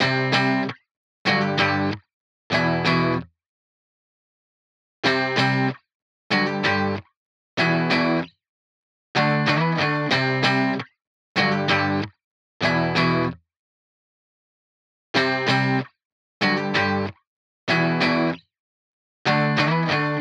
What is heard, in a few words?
95 BPM G Maj Main Guitar; Loop Stems; Cymatics; Menace